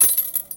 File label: Dropping Money